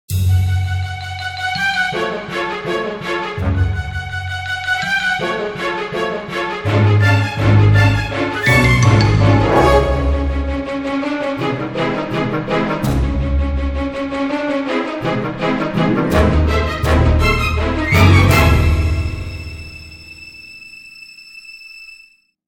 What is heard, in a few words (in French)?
avec harpe cors